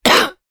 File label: human cough female 60 years old; zapsplat